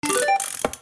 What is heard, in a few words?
lever winning; slots; gangsterallstar